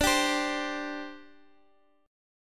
D 7th Suspended 4th D Guitar Open D

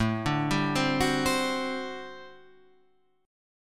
G Major 7th Suspended 2nd Suspended 4th A

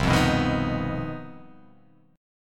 C Minor Major 7th Double Flat 5th chord

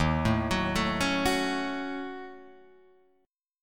Eb Minor 7th Flat 5th, Guitar Open G

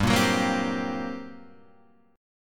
G Minor Major 11th, Guitar, Half Step Down

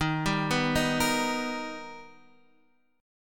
B Augmented Major 7th Eb, chord

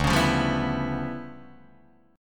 D Minor Major 13th, down, Guitar, DADGAD

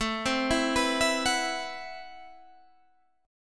A Minor 6th Add 9th, Guitar, Standard; Am6add9 arpeggiated; 17,15,14,16,17,14

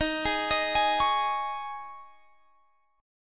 C sharp Suspended 2nd, chord

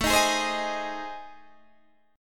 A Minor Major 7th Flat 5th, Guitar Standard; strummed; AmM7b5; JGuitar

